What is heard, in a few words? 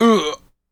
hurt